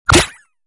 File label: Hit; Esquire